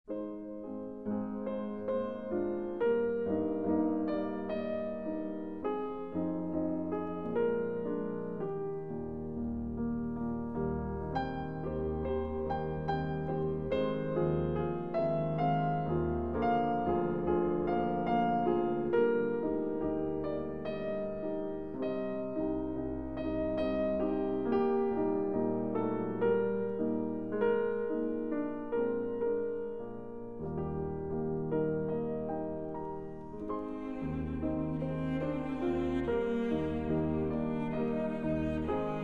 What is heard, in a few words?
piano trio; widor